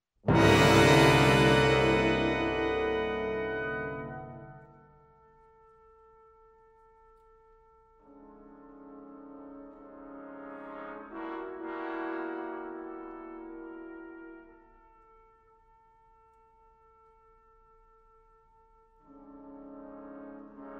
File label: Symphony for Brass and Percussion, Andante